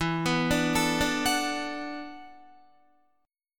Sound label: E 9th Suspended 4th E down Guitar; E9sus4 arpeggiated